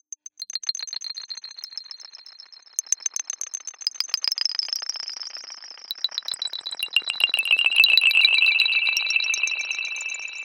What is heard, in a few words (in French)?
cloches; summer camp